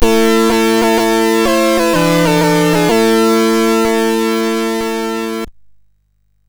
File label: sid MOS6581R2; Fiendish Freddys Big Top O Fun